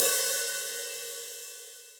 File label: Hihat Open Bright Room; Drum Hits; Standard Hits; Ableton; Factory Packs; Session Drums Studio